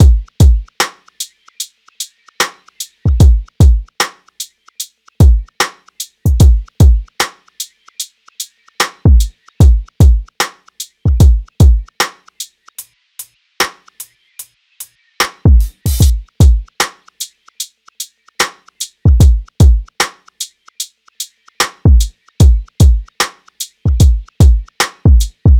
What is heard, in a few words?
drums 75, Full Drum Loops; Slate; SEISMIC Drum Sample Pack